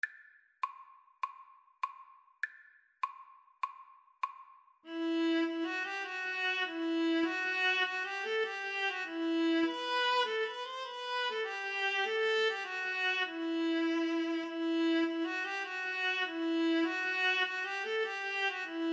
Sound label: Viola Duet